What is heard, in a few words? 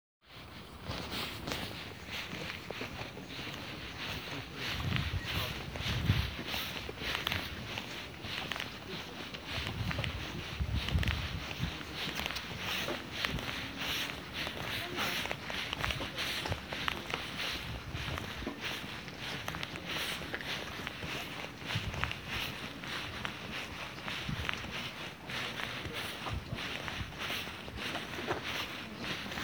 sound of walk; Trees Bokrijk; Workshop Trees